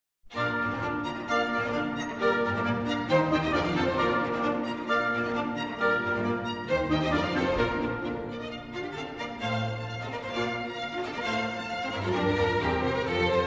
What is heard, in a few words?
Cassation in B-Flat Major, Menuet; Mozart, Wolfgang Amadeus